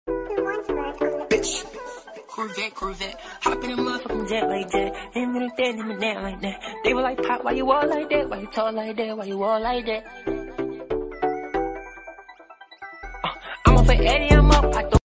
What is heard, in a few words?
corvette